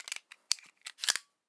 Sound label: pocket pistol lock and lo; nova